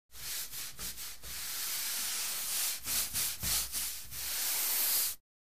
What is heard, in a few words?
Billiards Brush Tab